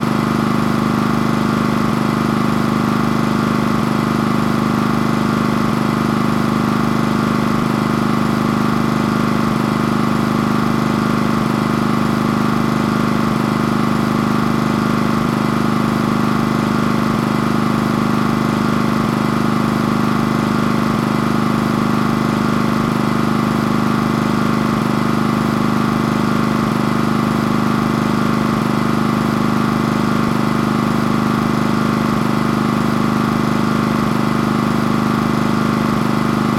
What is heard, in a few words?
industrial petrol generator small operate looped